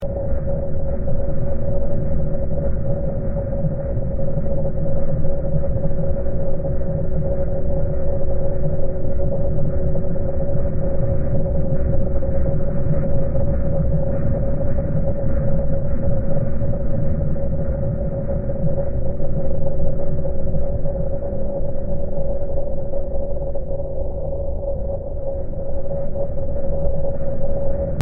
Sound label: Black hole rumble and radiation